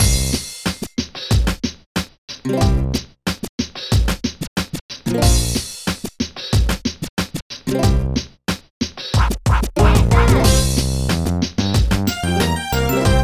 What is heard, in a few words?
Music MEDIEVIL BPM92; Super Scribblenauts 2010 NDS